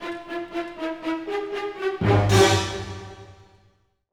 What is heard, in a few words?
Orchestral Loops